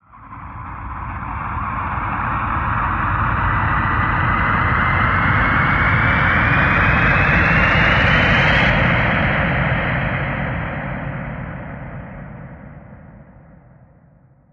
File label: Distant Shuttle Launch Rise